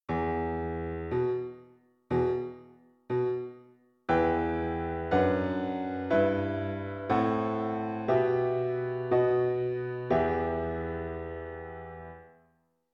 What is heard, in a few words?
Piano